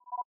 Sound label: terminal level